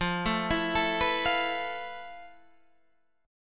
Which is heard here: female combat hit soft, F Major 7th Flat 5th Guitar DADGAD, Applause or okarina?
F Major 7th Flat 5th Guitar DADGAD